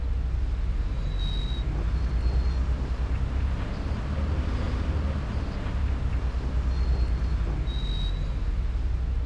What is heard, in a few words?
city; lasvegas